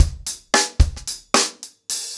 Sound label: Hip Hop 110BPM, acoustic drums drumloops